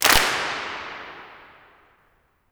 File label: CLAP, MONDO CLAP